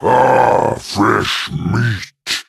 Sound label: Carnivore; Swamp Monster